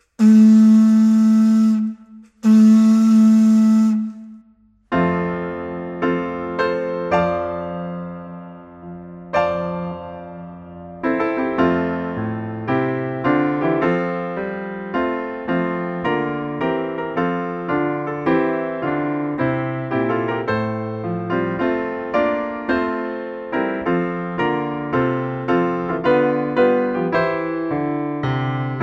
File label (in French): Hymne NC, Piano, accompagnement